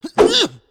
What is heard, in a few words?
Character Minion Attack; Angry Birds Epic